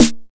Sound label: SD, Big Beat Drumloops